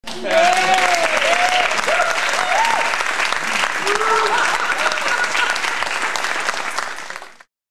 people cheering

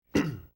clearing throat

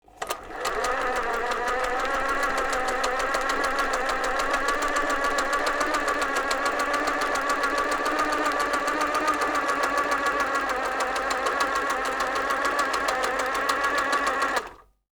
sewing machine, machine sound effects

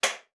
light switch

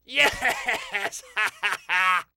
yes hahahaa

